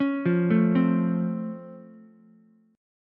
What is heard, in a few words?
Db Augmented Major 7th, down, Ukulele; arpeggiated; JGuitar